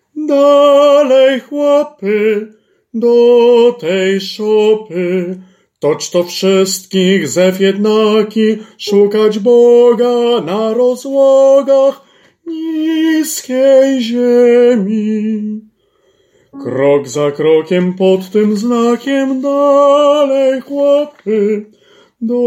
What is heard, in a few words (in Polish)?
Sopran; Za gwiazda